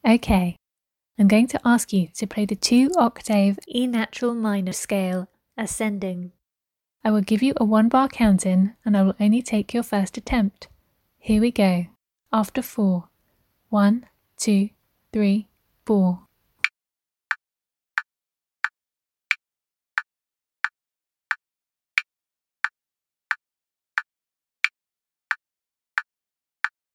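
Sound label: e natural minor ascending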